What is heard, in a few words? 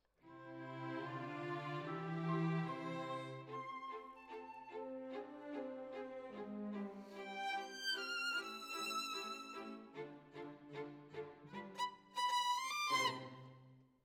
Violin Solo